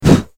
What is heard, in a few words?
knight landed; Politburo